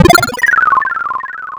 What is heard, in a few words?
hp suck; mother2